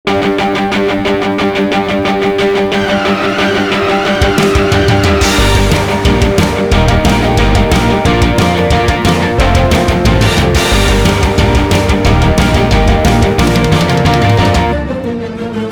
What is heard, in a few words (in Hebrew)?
רוק מטורף